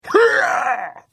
Hit

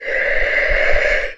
moan

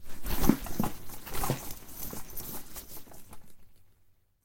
Leather Armor; SyrinScape; Iris hill manor

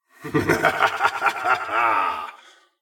laughter; Eshimal; SyrinScape; Snarl and Oraculum

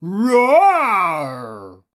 dino roar

